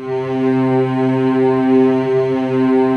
SLOW STRINGS, JV PADS; Pro-Rec - Synthsonic

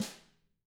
DW2 HIT, Drums; DW KIT1

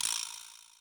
percussion, Millenium MPS-400

